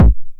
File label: 909 BD Tape Sat